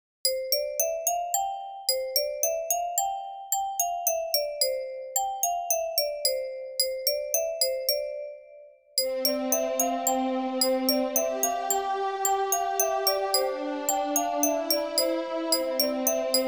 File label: inst